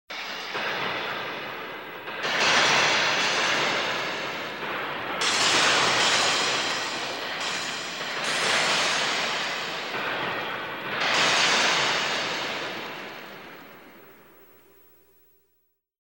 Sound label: synthesizer; SOUND EFFECTS1